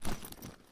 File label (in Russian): poisk nuzhnoj veshi v pohodnoj sumke